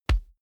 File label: combat chest hit